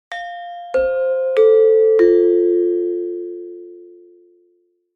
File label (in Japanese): ピンポンパンポン